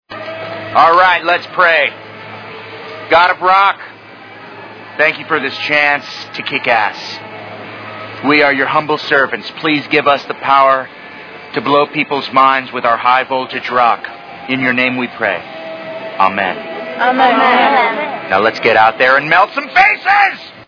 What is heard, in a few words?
rock prayer